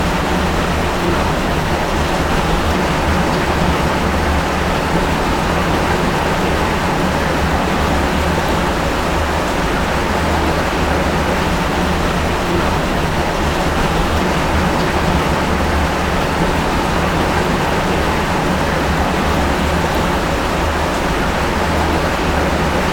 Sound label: factory drainage